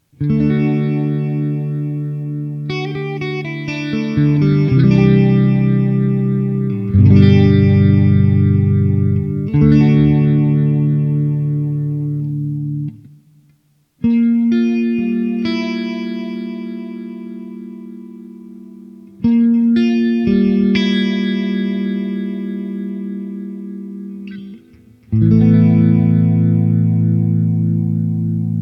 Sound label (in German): Phaser Vergleich